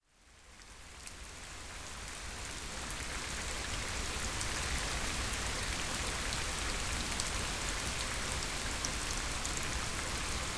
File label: rain full sergiyev posad